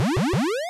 sound warp; singe; spacerocks

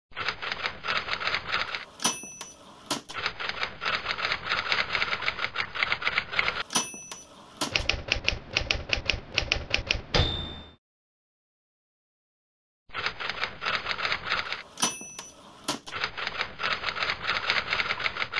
z type; singe

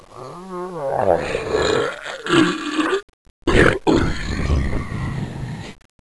houndeye alert; xash3d fwgs

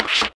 door; cdogs

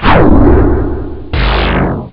upgrade; hurrican

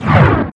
swarmer; cdogs

